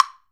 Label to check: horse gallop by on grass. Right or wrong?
Wrong